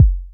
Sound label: kick; kogamusic